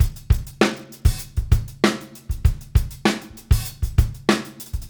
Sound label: Beat Loops, 098; Attitude; Zero-G - Monster Beats